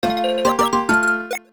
berry tree pick; Pokemon RSE

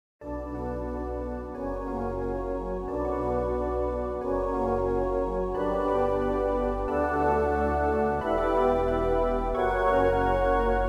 menu pattern

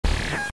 Sword Shoot; LOZ

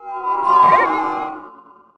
bellow fish swim

